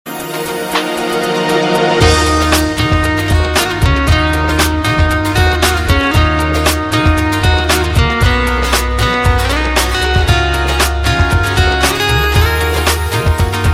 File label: MY Guitar